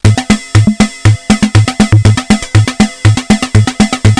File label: drums